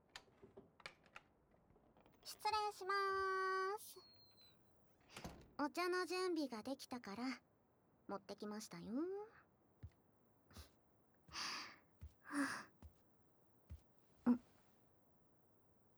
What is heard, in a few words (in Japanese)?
囁き 耳舐め 【左耳】